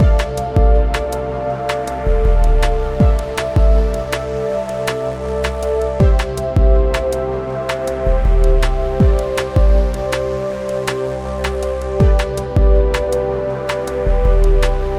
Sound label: atmospheric pads